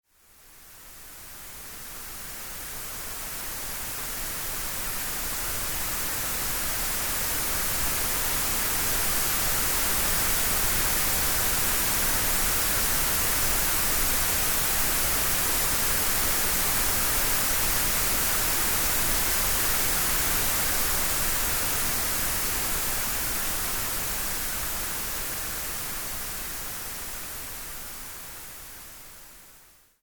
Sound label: soft white noise; sound oasis